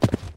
Horse Footsteps; Legends

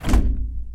Greenhouse Roof Closed

